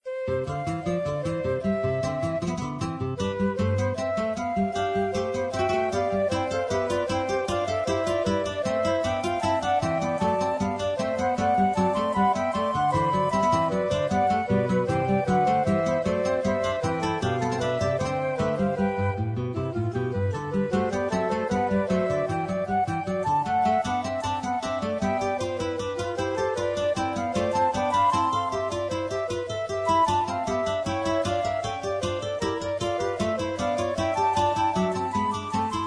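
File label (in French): inventions pour guitares flûtes; bach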